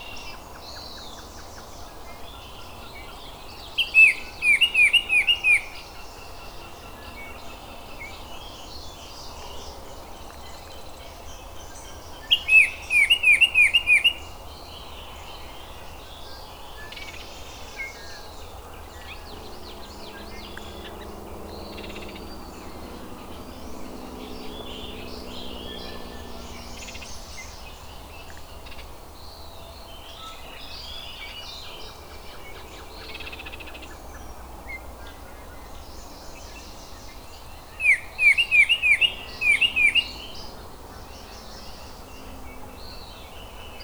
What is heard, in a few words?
oriole; Oriole 2009, version 2